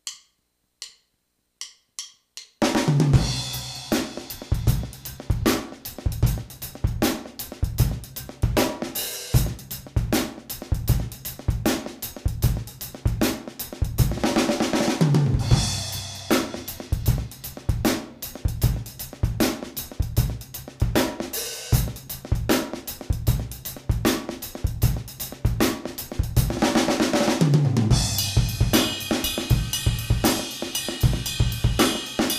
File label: 155 BPM, This is a 12/8 groove; drum; inspired by Bernard Purdie's "Purdie Shuffle" and Carter Beauford's 6-over-4 grooves